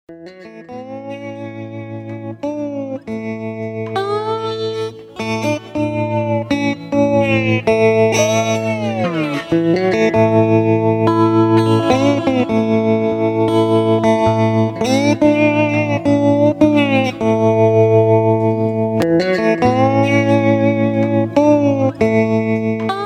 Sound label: solo